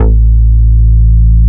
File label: Bass Root A